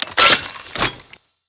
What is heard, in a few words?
flame in, flamethrower; kf2; weapons; trapper